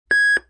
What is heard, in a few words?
beep; noisetoys